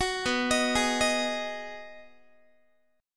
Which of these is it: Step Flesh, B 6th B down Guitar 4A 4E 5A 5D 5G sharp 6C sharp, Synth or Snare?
B 6th B down Guitar 4A 4E 5A 5D 5G sharp 6C sharp